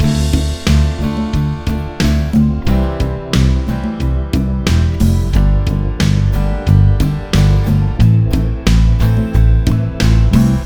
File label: Using Barre Chords Exercise Loop